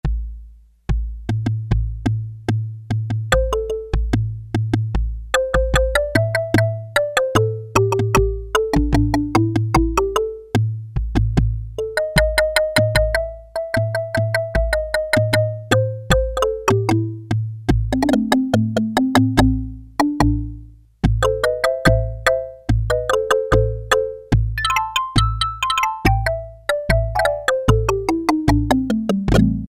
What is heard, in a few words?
Metal On Wood, Roland MKS-70